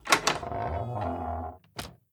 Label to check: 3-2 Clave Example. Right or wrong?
Wrong